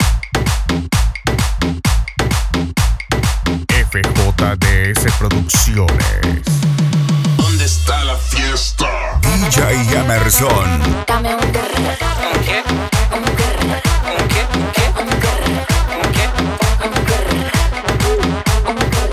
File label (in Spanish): Guaracha; guaracha brutall para hora loca